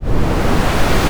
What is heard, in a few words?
dark riser